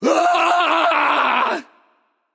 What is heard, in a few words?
battlecry; smite; kumbhakarna